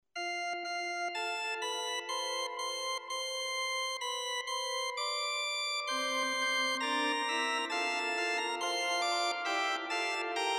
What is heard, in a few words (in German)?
Fuga Positiv, organ; sonusparadisi; Seger